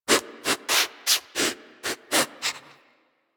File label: Armadillo Vocal Sniff Multiple; Characters; Warframe; Hunting Critters